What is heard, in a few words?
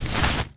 change socks; Tony Hawk's Project 8; XBOX; bedroom